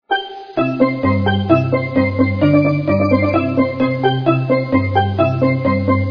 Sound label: Steel Drum